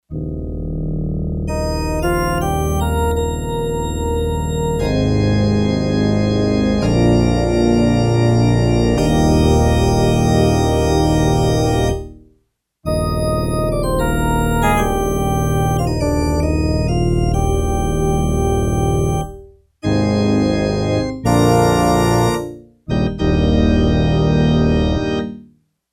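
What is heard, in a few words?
Split Cathedral Organ, Yamaha TX802; Factory Patches; Performances; Split Cathederal Org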